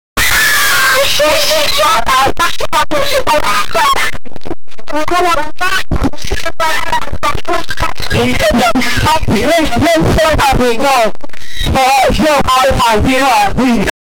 mocking lough by 3 person

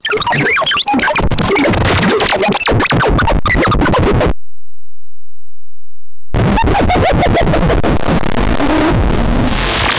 urinating against the sid, bathroom

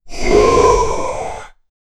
great demon awakening, demon

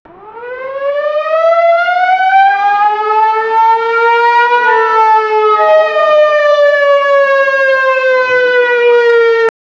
air raid siren

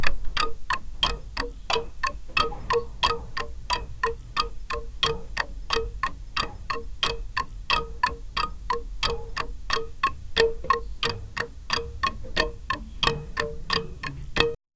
steampunk small mechanism, clockwork

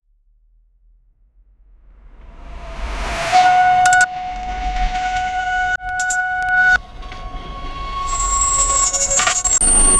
urban morning chaos start, car horns

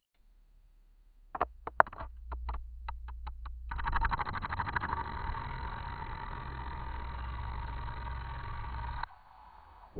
long slow high pitched so, paper

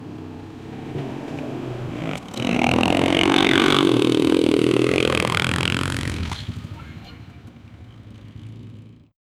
motorcycle motorcycle engi

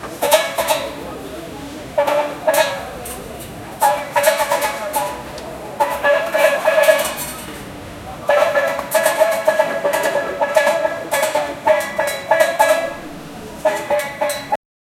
market chatter blacksmith, crafting

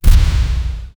impact

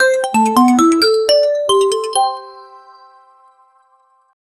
win jingle retro arcade

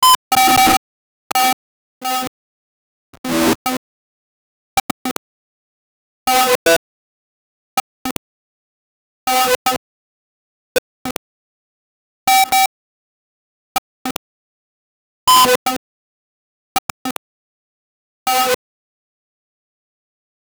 error windows glitch

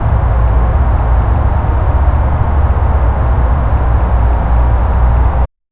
corridor ambience with fa, hum

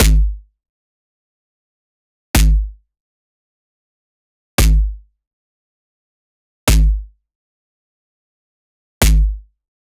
snare 808 clap

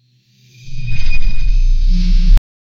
scifi sound for a boss; sound effect; boss battle

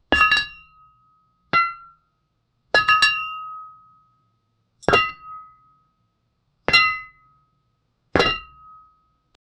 heavy metal lid lift

